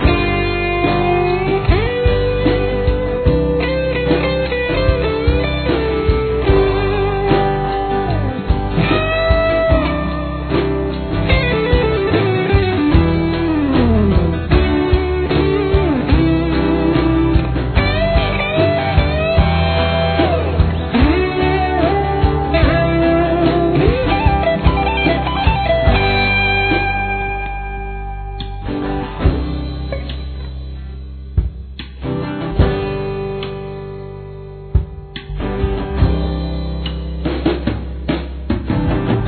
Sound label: solo main, guitar